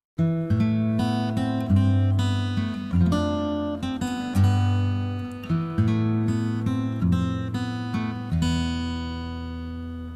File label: guitar song; metro 2033